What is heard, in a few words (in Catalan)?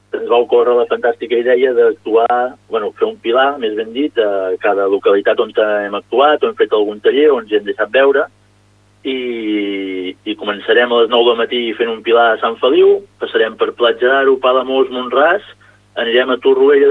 castells